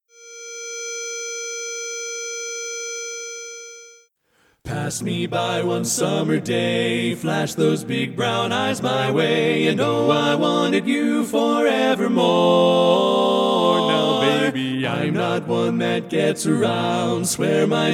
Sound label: Tenor missing; Polecat II